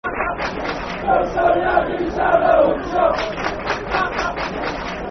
You're So Loud You Sound Like Aldershot football chant (soccer song); Brentford